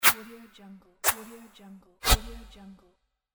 cartoon swooshes

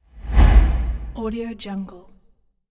sub sweep

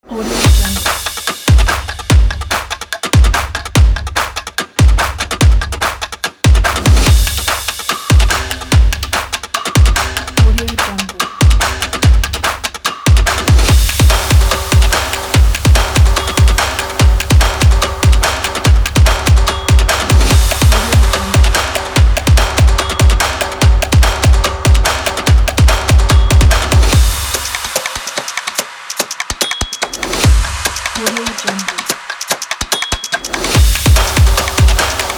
tribal percussion; fassounds

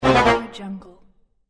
game failure sound

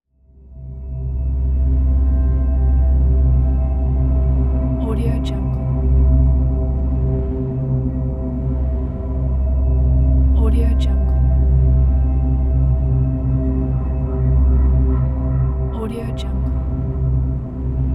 dark mysterious drone

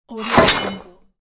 sword slash

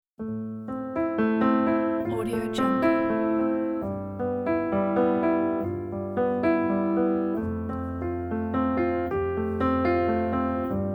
cinematic melancholic piano

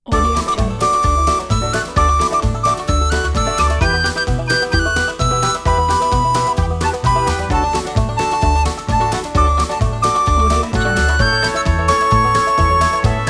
upbeat train beat recorder

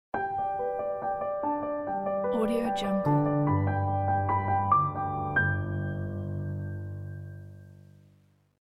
elegant piano logo

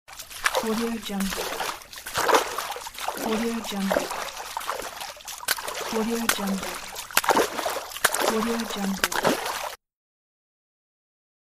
footsteps walking in water

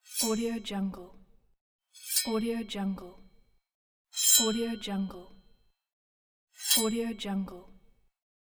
light iron swoosh

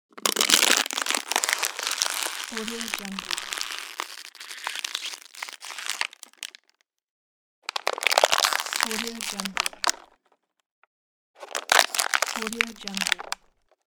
water bottle crushing

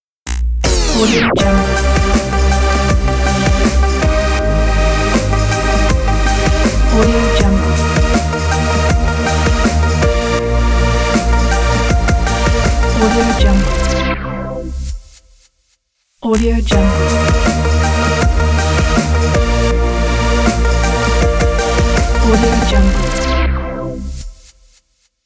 midnight ident

